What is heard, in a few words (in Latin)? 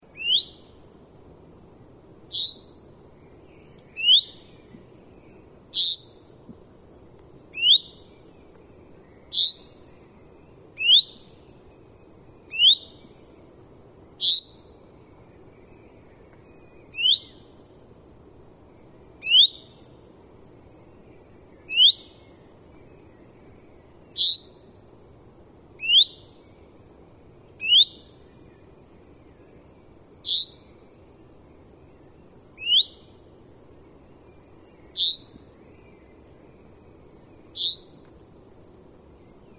Fringilla coelebs; SETE